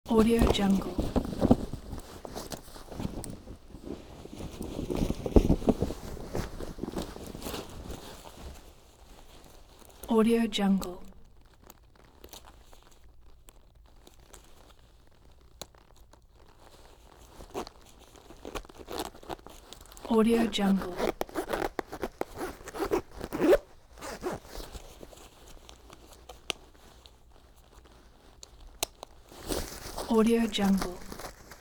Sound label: canvas jacket with buttons and zipper